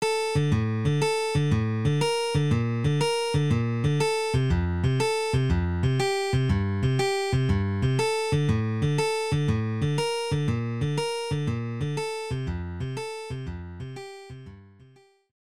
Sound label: game over, phone; fruit factory